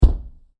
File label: Knocking On A Wood Door; Doors; Door Knocking (Sound Effects:Doors); CraftedSignals